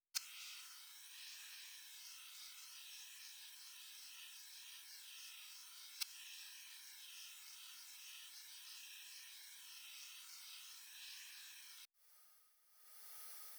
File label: noises lasers; TRUTH-HZ; Crowdsourced Sample Pack; Digital FX; livey